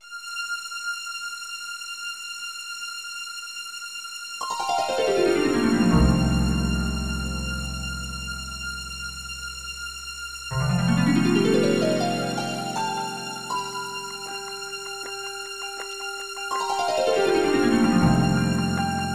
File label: Orchestral; JoosTVD; For Your Pleasure Vol1